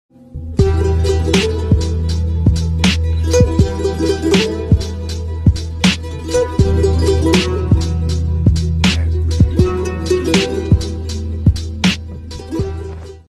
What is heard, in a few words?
music dubsmash; iran